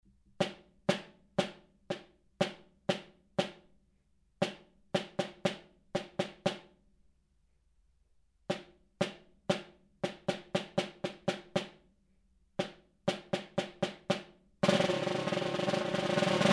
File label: snare